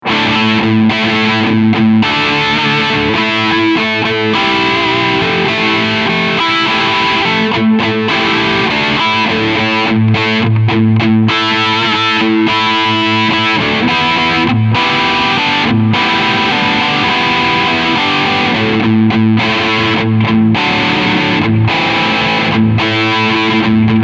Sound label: Medium Gain